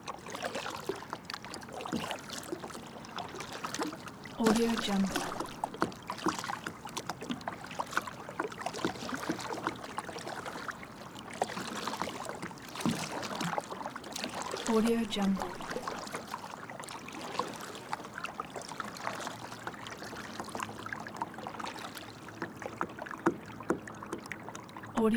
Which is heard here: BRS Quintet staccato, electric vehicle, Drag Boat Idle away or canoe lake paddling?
canoe lake paddling